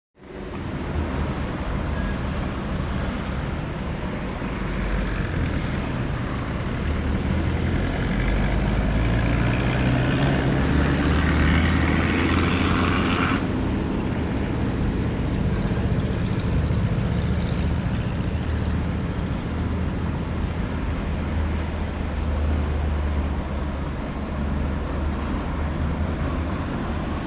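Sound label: City Traffic Third World, foreign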